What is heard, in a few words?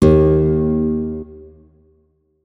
notes, guitar; Hideout Furniture